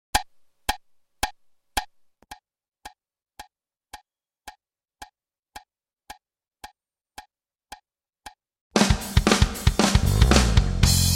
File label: 90s rock